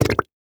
mud fart bubble

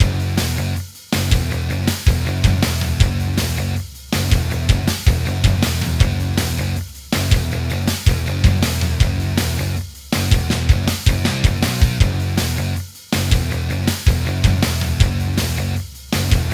3d Music

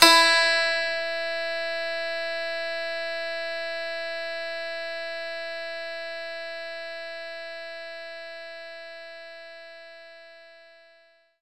Koto E4; Knights Try

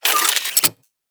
Weapon Reload (Laser)

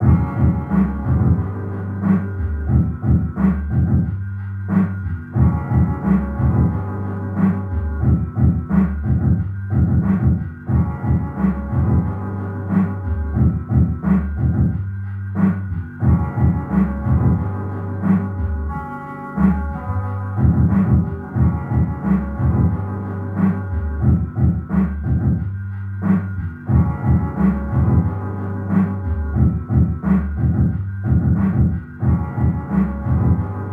Radio Outside; Latino Ghetto Radio 2

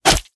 Fight Attack Bow Damage; Mighty Party

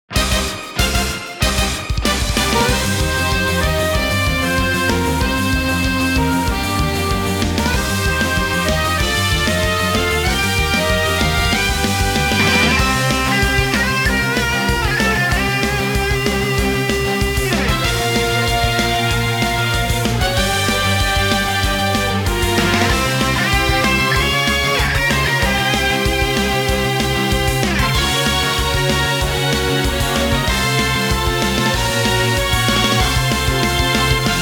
Battle; Pokemon Masters EX; Platane

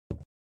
walk wood; Out of Reach: Treasure Royale

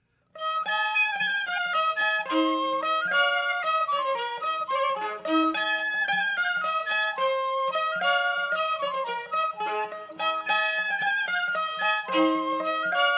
Tavern; Assassin's Creed Unity

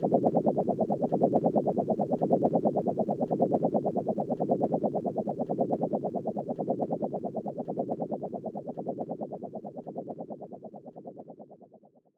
swords

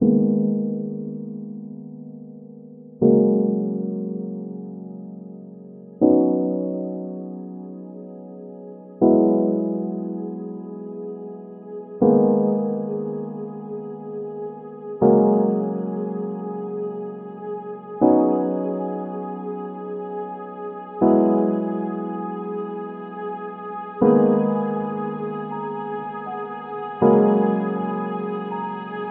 Documentary Emotional Piano; All Quiet Roads

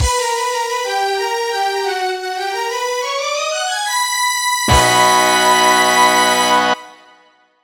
Win; Scarab

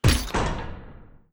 switch gate switch; Hollow Knight

